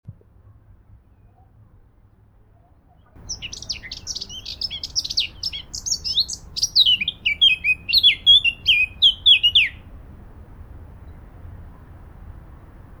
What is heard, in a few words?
Sylvia atricapilla; SETE